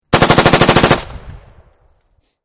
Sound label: M16 long burst, Assault Rifle; Assault Rifles; Guns; VRSonic